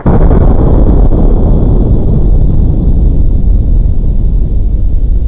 thunder

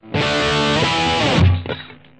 crunch chords, Guitars

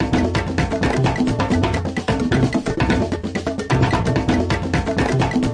130 bpm cuban polyrhythm three bars

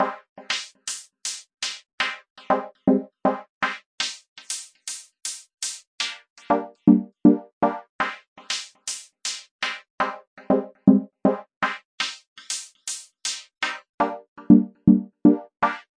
Pad Wash, 120BPM; Breathing Circle

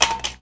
Clangy Crunchy Foundsound Kit; levy

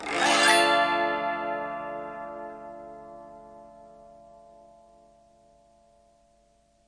autoharp D min, autoharp chords; Multisampled Autoharp

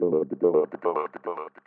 144 filter stutter; VOCAL OR VOXY LOOPS

